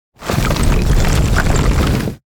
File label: burrow ranged 15ft; creature movement; psfx